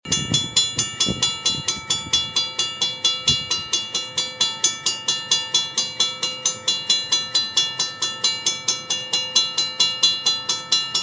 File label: Rr Xing Bell